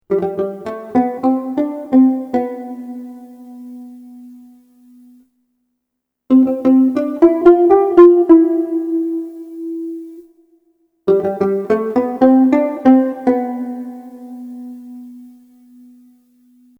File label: v bass banjo